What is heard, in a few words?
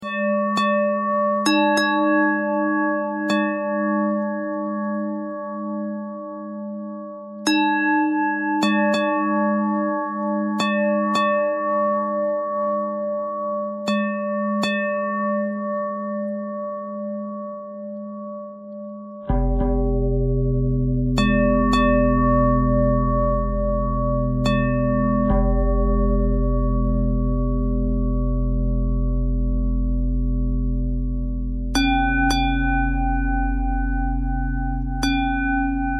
bell thread bend thread